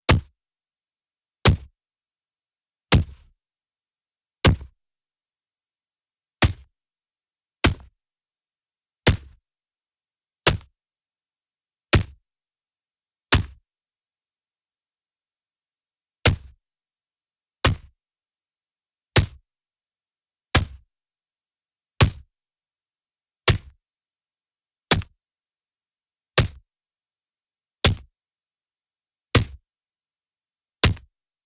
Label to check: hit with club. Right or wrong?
Right